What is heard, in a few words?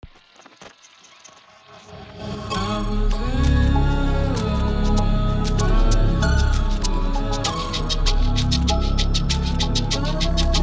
Eyez 97bpm C minor, beats